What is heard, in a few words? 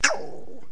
auw; Raft Wars